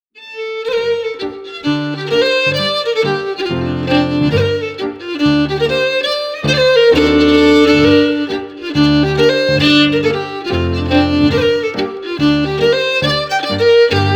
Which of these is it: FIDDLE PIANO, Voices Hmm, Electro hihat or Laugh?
FIDDLE PIANO